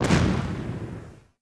Alien Death; Harvest Massive Encounter

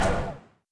Gun; Harvest Massive Encounter

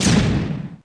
Missile Main; Harvest Massive Encounter

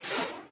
Missile Launch; Harvest Massive Encounter

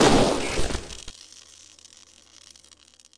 Tempest Hit; Harvest Massive Encounter

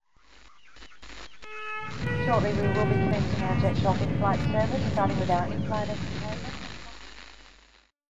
Planet Hover; Harvest Massive Encounter

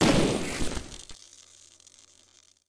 Tempest Hit; Harvest Massive Encounter

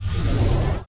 Alien Charge Up; Harvest Massive Encounter

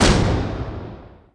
Eagle Explosion; Harvest Massive Encounter

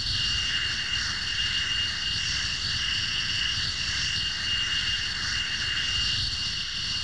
Drop Ship Engine; Harvest Massive Encounter

